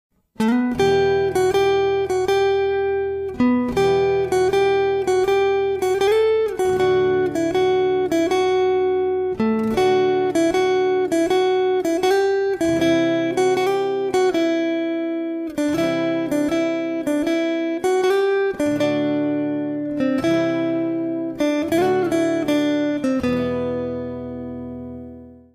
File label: short lesson guitar music